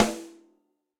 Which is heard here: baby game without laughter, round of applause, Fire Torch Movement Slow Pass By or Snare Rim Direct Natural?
Snare Rim Direct Natural